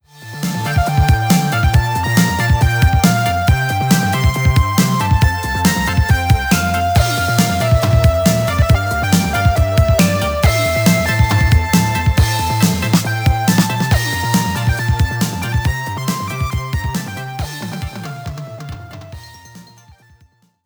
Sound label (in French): Synth fighter extrait